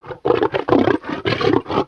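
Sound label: Pets Triceratops Ecstatic; creatures; Lego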